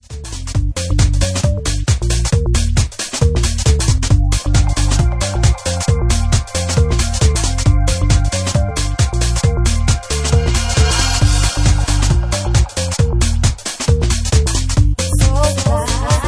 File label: Organ Mix